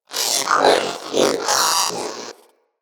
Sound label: Zanuka Companion Vocal Breath Run; Pets